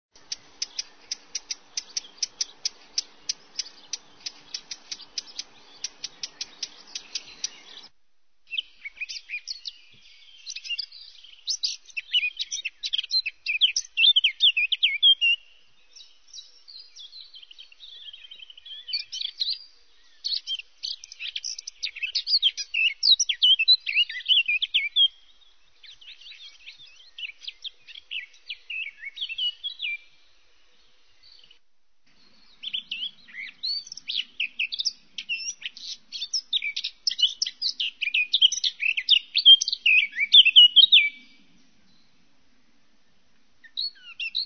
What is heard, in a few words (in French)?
fauvette a tete noire